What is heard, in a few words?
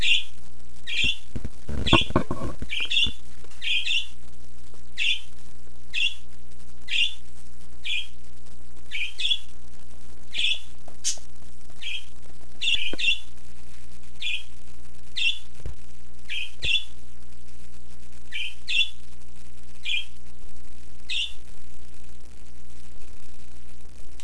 Happy Chirping, parakeet